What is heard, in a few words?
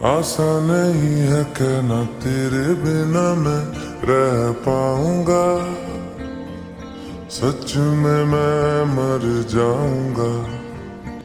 Ringtone slowed and reverb